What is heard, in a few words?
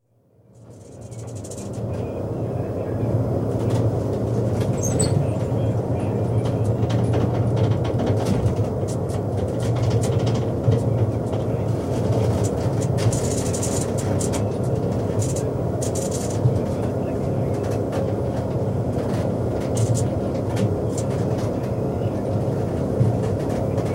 Beam Fishing Trawler, atmosphere in wheelhouse with indistinct R/T; Boat Sound Effects; BBC